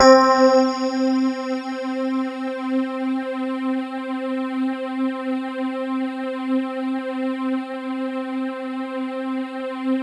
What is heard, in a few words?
C4, Synth Line; 90 s Sample CDs; NiceBeats; SynthLine VOL-31